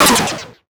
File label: Digital; Adobe; Multimedia Internet CD-Rom Flash